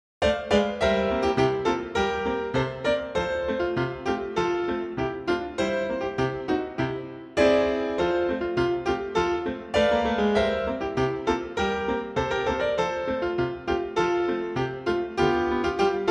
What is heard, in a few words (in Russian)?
Sportivnyj marsh